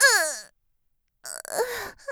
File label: die; Eternal Return; Tia; Voice Line; KOR